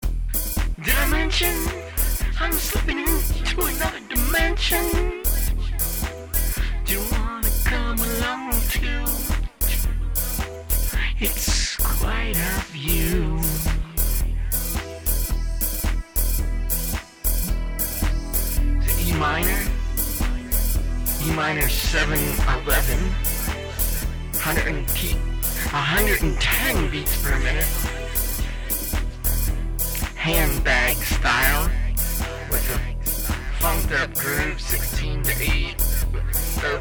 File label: Soundcheck